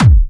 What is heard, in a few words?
Bassdrums Clubby Kicks; Vengeance Samples